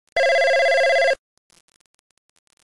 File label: Business Phone, alarms